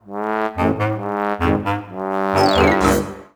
Loss

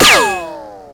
metal solid hit; projectiles; bullethit; Max Payne

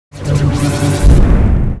fan angry; Stake Fortune Fighters; XBOX

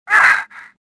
choke; stofficer

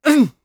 attack voice; UFC Tapout

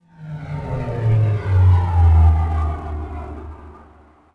flyby distant, vehicles

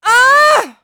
throw; UFC Tapout; XBOX

